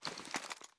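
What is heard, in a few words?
foley assault rifle ak47 inspect; weapons; wfoly ar ak47; viper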